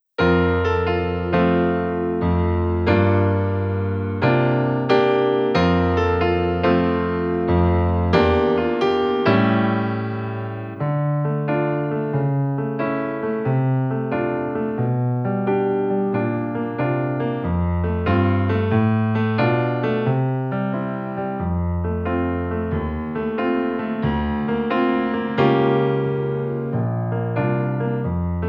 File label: karaoke version